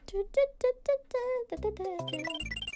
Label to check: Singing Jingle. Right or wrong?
Right